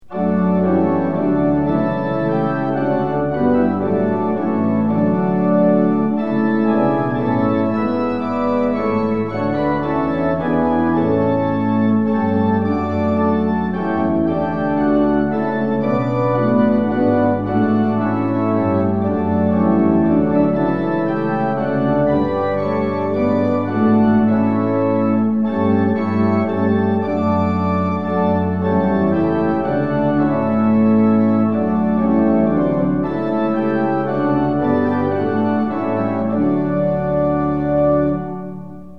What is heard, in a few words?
tune; hymns; lillenas